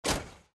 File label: sound Place Building